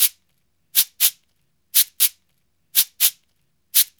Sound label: CABASA; Sonic Foundry (Sony Creative Software); Joe Vitale Latin Percussion